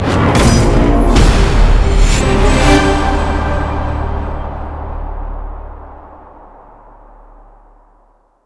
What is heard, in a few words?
Mission Complete; Modern Combat 5 Blackout